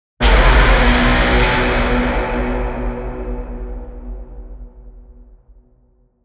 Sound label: Impacts Horn; Gothic Storm Music; Advanced Sound Design